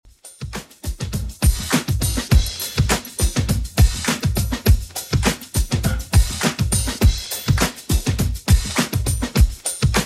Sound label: 90s Redrum, 102 bpm